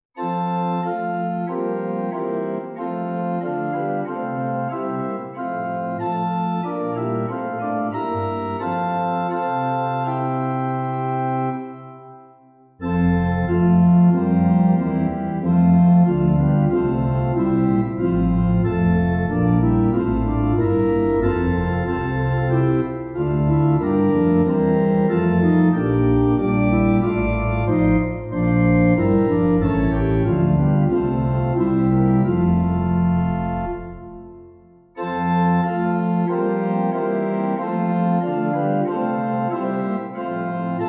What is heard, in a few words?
Cross Of Jesus Cross Of Sorrow Organ 4 Verses; Hymnal 1982